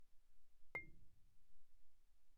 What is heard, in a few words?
Marimba cord pp C7; Percussion